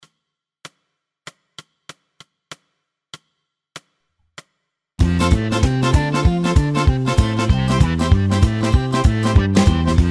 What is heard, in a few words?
Balkan Express backing track